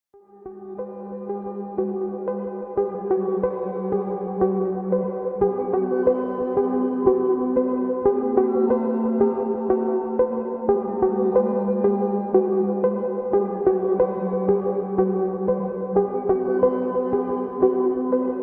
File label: slowed reverb bass